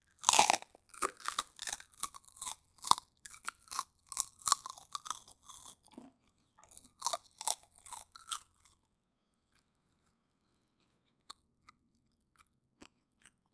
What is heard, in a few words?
crunch of biting into the